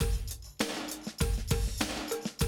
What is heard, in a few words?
drum loop, 100 BPM